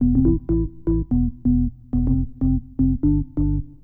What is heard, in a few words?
organ house 125 A; eJay; Keys